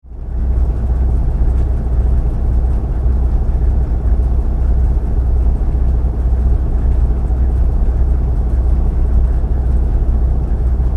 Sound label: Ship Large Car Ferry Engine Room